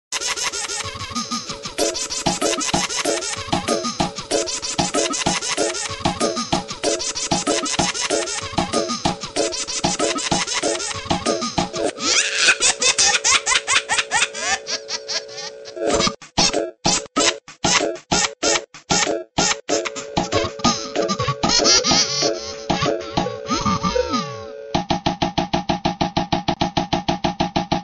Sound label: Baby Drumbeats Laugh